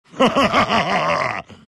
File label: laugh; dota; tiny